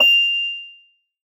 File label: soft hit whistle; osu!